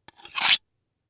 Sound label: Knife Sharpen Single Stroke Metal Scrape; Knife Sharpen Blade; Hand Tools; Foley; Blastwave